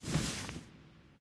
cloth; smite

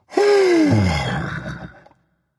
content berserker; cuchulainn

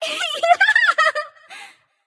yay light; smite; voicepack; hel

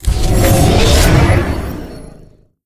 fire; Smite; Zhong Kui

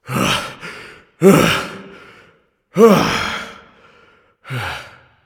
breath

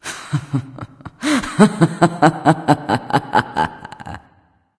"heh heh"; smite; nike

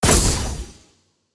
hit; smite; hera

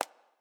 shoe tap; Smite; Baron Samedi

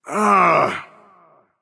Ugh

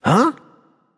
loud sound; smite; voicepack; osiris

